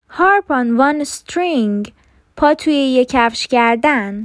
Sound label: harp on one string